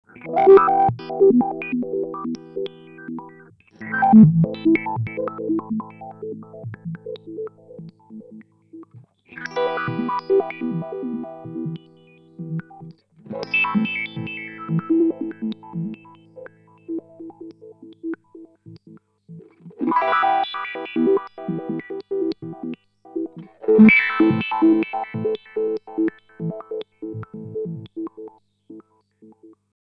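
Earthquaker Devices Spatial Delivery Sample and Hold Fast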